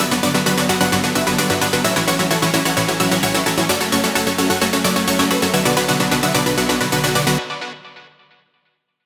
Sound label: Synthwave Synth Pop; midi wizard 2; Hybrid Advanced